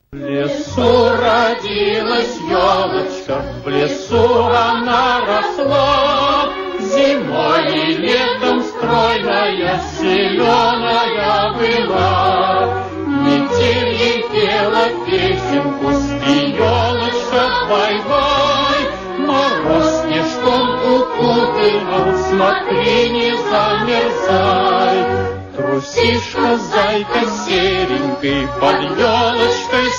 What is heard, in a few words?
melody